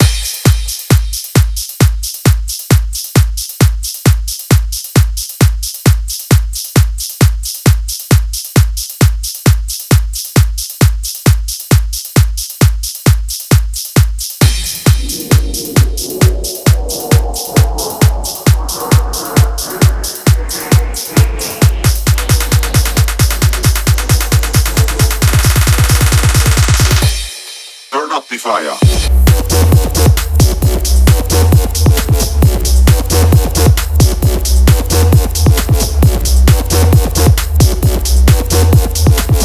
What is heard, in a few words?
workout music gym tabata songs tabata house workout mix